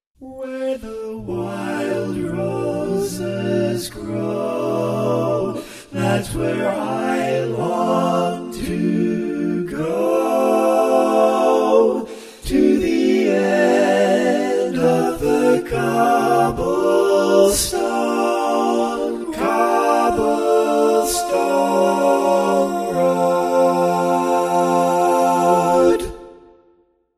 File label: All Parts, barbershop